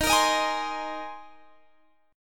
A sharp 7th Suspended 2nd Suspended 4th

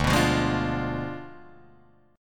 D Diminished 7th D down Guitar Drop D

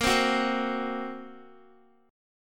Bb Suspended 2nd Sharp 5th, Guitar; Bbsus2#5; strummed; x,13,10,11,12,x; JGuitar

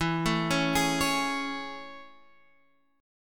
A Major 7th E

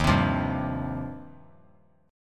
D sharp 6th Add 9th, D sharp, down; strummed; JGuitar

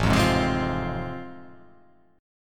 A Minor Major 7th Sharp 5th

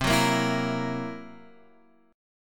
C 7th Flat 5th C down, chord

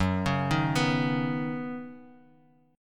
F sharp Suspended 6th; arpeggiated

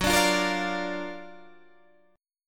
F sharp Augmented Major 7th F sharp Guitar DADGAD

